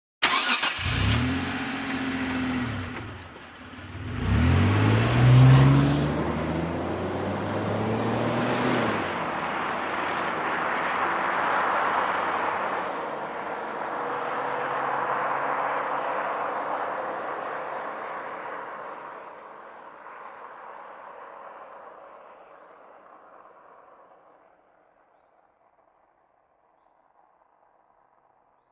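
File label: Montero Start Fast Away, 1998 mitsubishi montero ext.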